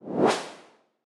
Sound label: sweep longer; CrossCode; battle